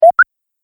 Collect Item Pick Up Sound, video game sound effects